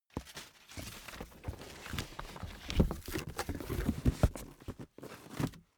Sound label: cardboard box small handle; objekte